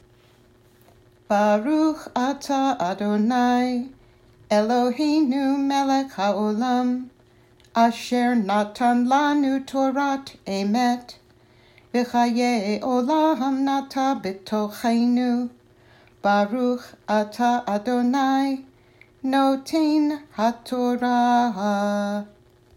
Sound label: Blessing After Torah Reading